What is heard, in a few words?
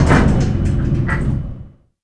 elevator stop